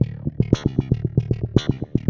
WAH FUNK, ARPEGGIOS; ILIO; Trance Fusion

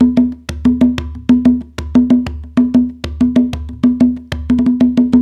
Udu Calypso

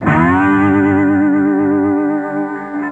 G, Guitars; Total Drum&Bass; 90 s Sample CDs

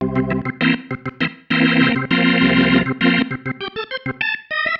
Pattern, Organ

